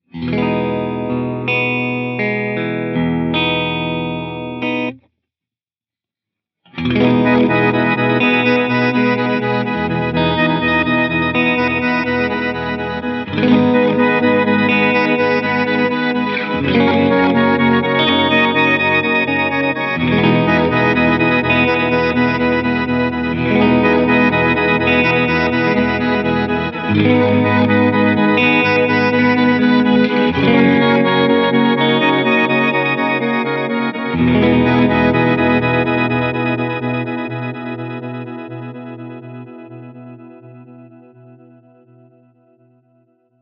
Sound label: Reverse Reverse Dual Pitch